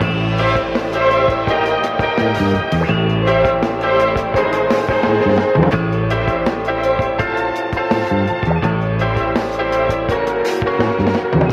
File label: strings, phonky loops